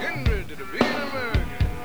glorg

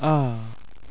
FAIL

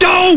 wrong

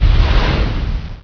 WOOSH

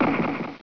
pistol

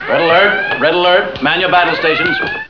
red alert

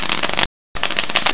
shuffle

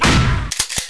shotgun

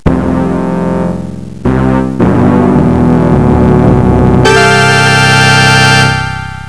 scare

